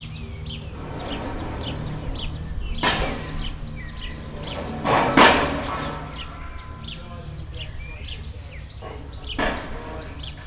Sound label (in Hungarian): elefant acelketrec bezarasa, afrikai elefant; nyiregyhaza; nyiregyhazi zoo 2010